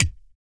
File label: GLOTTAL; Spectrasonics; Vocal Planet; Blues Soul